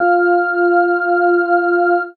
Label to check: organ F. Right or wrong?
Right